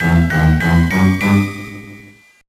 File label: Level Failed; Monster House; GBA gamerip 2006